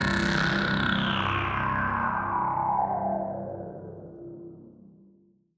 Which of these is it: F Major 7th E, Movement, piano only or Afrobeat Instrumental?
Movement